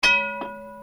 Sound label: trolley sound